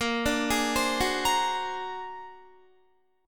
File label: Bb Augmented 9th Bb, Guitar; Bb+9; arpeggiated